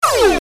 Futuristic Gun Sound; FXHome; Bullet and Gun

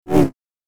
Double Bladed Saber Sound; FXHome

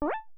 phase jump; Kenney's Sound Pack; Digital Audio

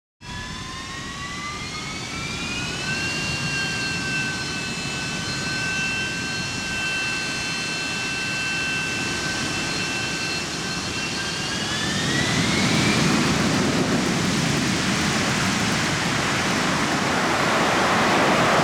Harrier JET, Aircraft, Vertical T; BBC Sound Effects Library

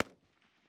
Rifle Mosin Nagant Short 7.62x54r Far; World Wars; WatsonWu; Rifles & Pistols Of The World Wars

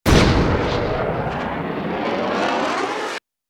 Bullet Time; FXHome; Bullet and Gun

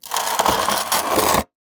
Scrapes and Scratches, Metal; SoundBits

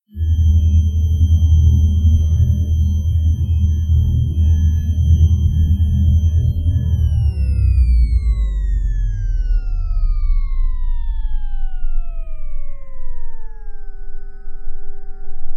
generator down; FXHome

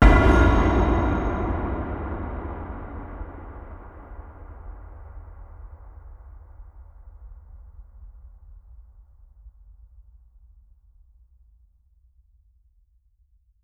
Far Hit; 99Sounds; Rumore Cinematic Impacts

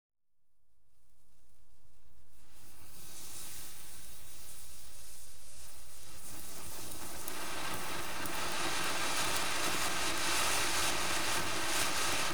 Fireworks, Retrojet Fountain; BBC Sound Effects Library; Explosions Guns Alarms

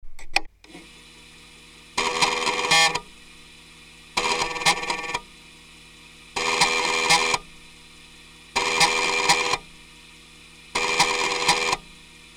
Atari PC2 - Floppy Failure, Computer Noises

